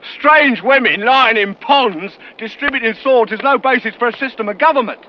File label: women